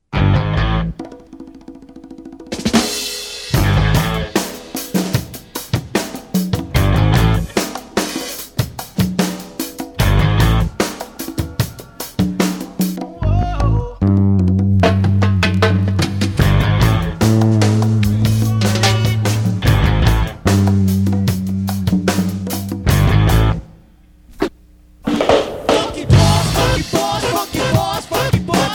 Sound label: Funky Boss